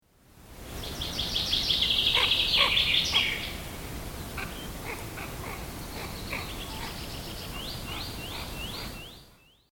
telkka naaras; linnut

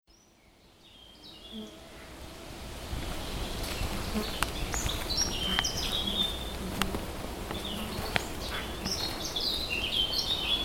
mustapaa; linnut

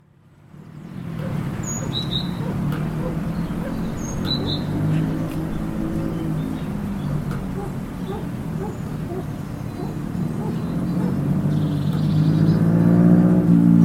fasaani; kutsu; (taustalla tiaisia); soidinääni; linnut

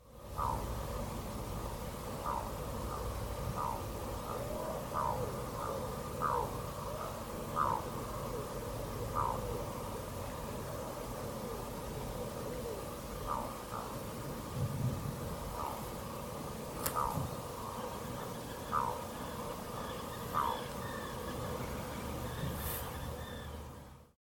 kuikan dialogi; kuikka; ”rupattelua; linnut

